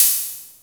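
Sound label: OPEN HH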